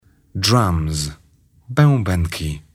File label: drum